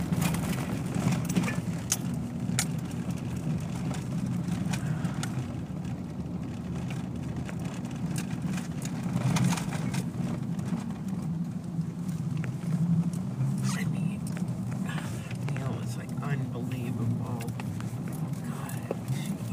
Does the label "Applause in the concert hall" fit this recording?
No